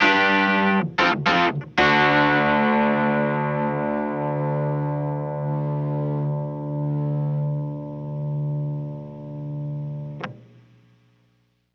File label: Clavinet Ending, 70s Analog Funk; Sonic Foundry (Sony Creative Software); What it Is! 70s Analog Funk